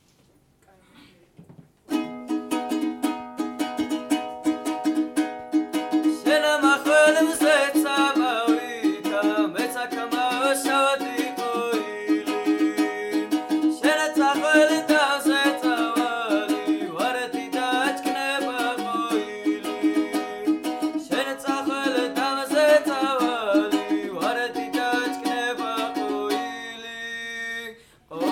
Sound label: Folk Ensemble, Svaneti songs